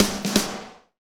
Medium Tekno, Drumscapes Roland

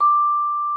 VIBES D6; Xsample VOL-4

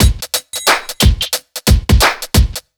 BEAT, Underground Hip Hop

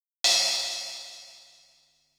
RM1X Drum Anlg Kit Chinese Cymbal; Yamaha RM1X

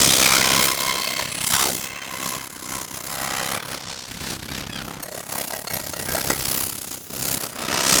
FX Ambient; Drums & Drones

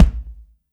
KICK, Percussion; InVision Interactive; GRUNT

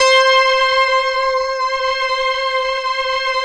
SYN, Analog; Sound & Vision; Gigapack I CD 2

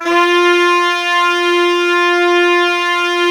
ALTO SAX F, SAX SEC.; Giga Samples Collection

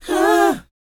BOYZ F, Vocal Planet R&B & Dance; ILIO

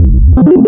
Hungarian Flute, wavetables; Hungarian HMX Flute; Animoog

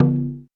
Roland U220 Dry Set

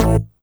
Synth Hit A

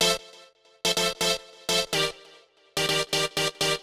Synth Loop (125BPM), retro house

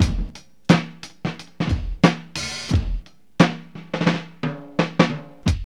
EASY FUNK 88, LOOPS; Zero-G

